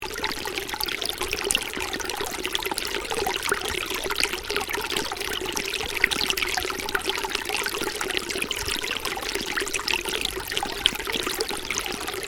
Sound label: Stream: small stream running, loop; Water; Nature; Stuart Duffield